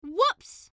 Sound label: whoops